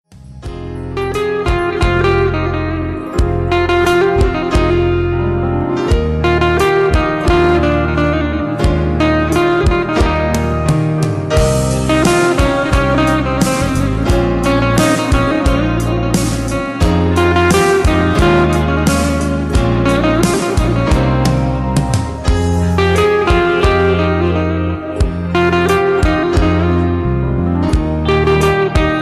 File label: turkish music